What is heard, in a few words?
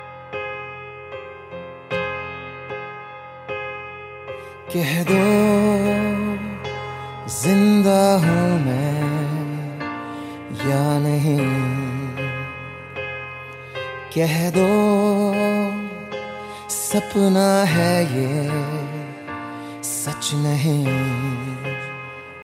song ringtone bollywood ringtones